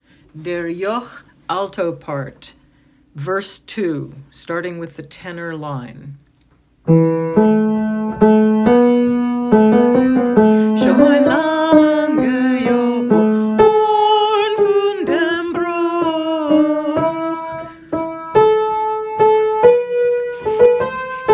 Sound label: verse alto part; Der Yokh